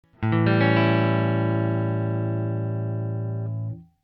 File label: a7 open